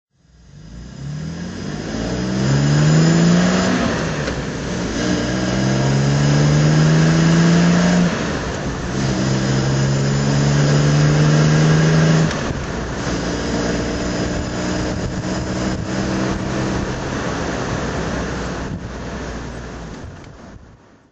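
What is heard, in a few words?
jaguar xk150dhc drive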